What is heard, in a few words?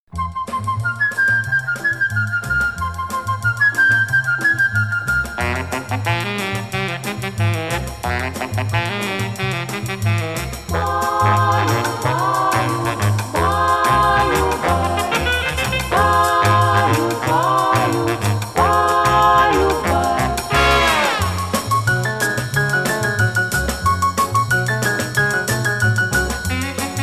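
Vocalise lullaby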